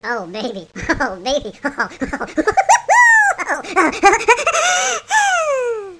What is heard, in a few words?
Chipmunk Oh